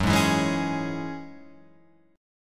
F sharp 7th Suspended 2nd F sharp down Guitar Open F; F#7sus2 strummed